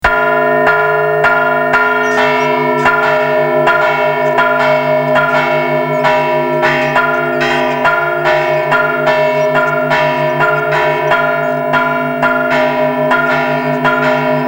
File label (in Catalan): campana